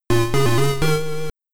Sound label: Game Over (AY); Tetris; ZX Spectrum